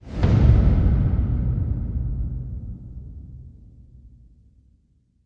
Hit Low Gentle Swoop

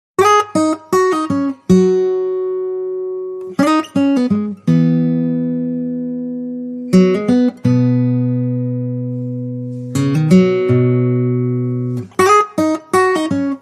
Solo Lead

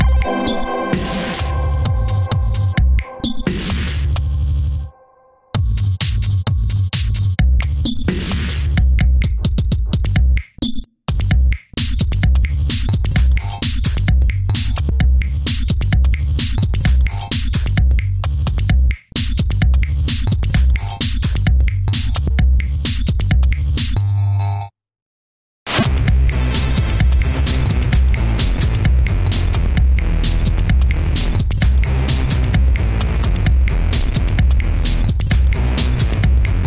Japanese Subway Beat

